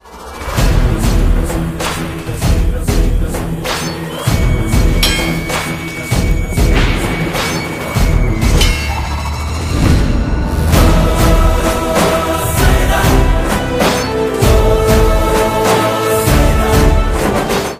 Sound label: Theme Music Ringtone